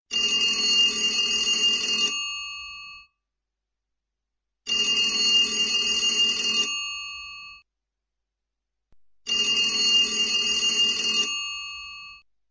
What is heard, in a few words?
Antique Ringtone